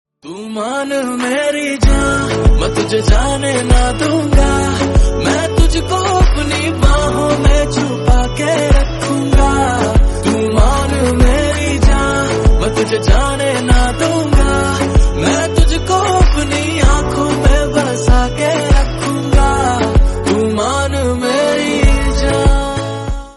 romantic bollywood song tone ringtone

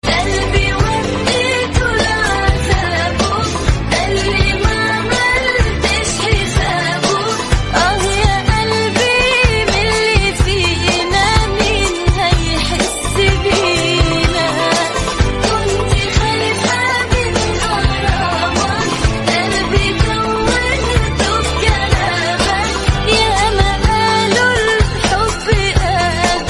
arabic ringtone

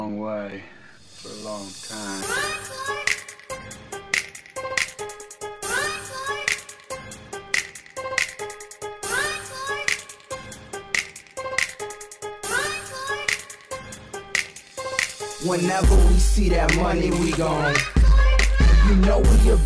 mix hit ringtone

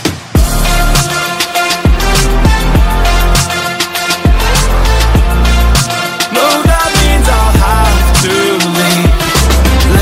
new music sweet ringtone

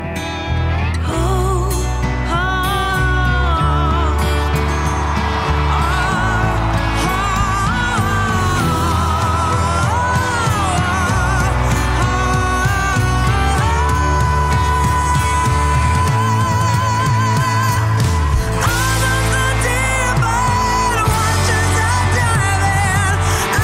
ringtone, english ringtones

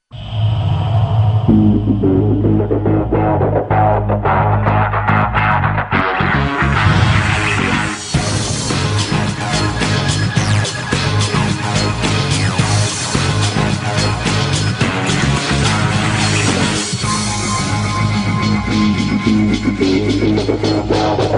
sports ringtone; galaxy ringtones

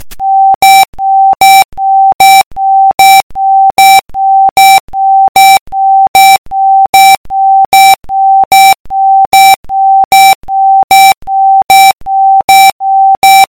alarm clock classic ringtone

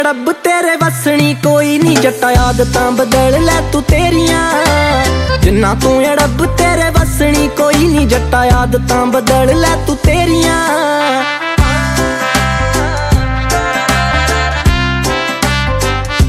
punjabi ringtone; islamic ringtones

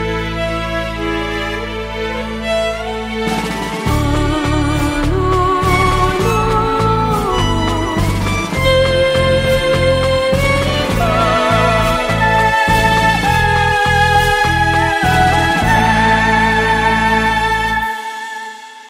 piano ringtone, bollywood ringtones

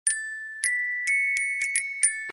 soft sms ringtone

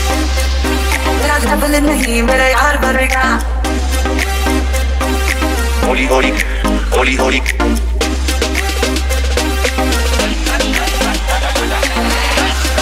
dj hindi remix ringtone

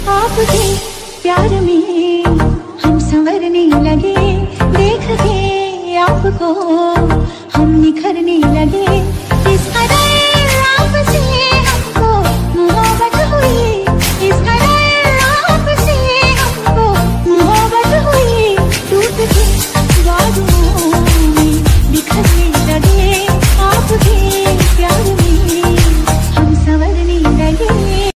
bollywood ringtone

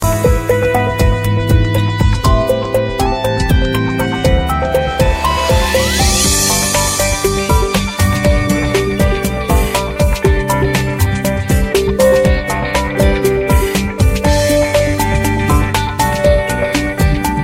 samsung ringtone; beyond; samsung new

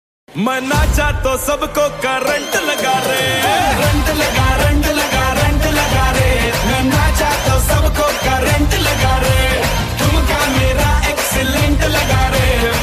funny song tone ringtone, bollywood

